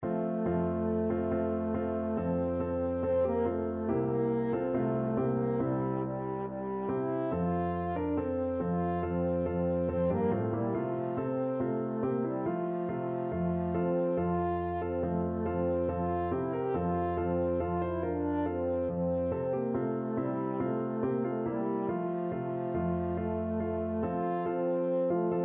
french horn

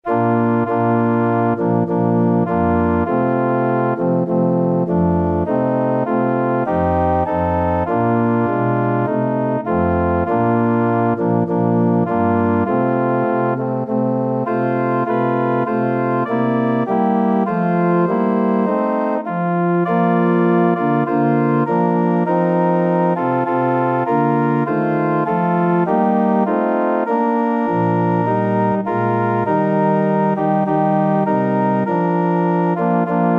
organ

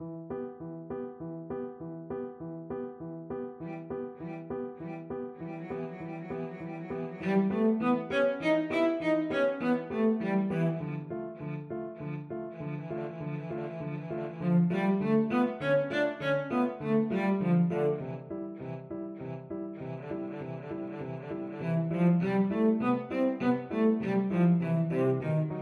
violin cello duet, Violin-Cello Duet; chanson boheme

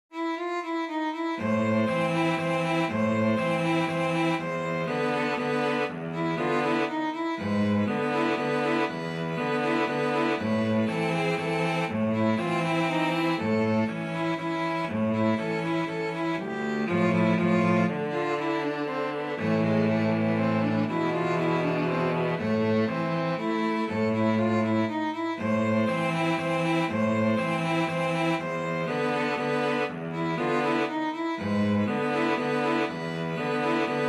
violin cello duet

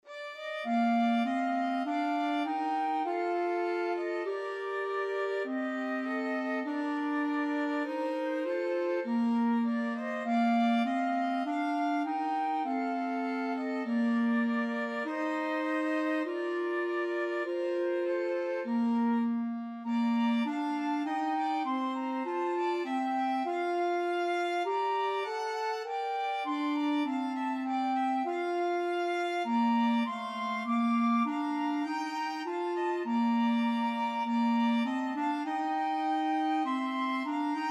hail holy queen, flute clarinet violin

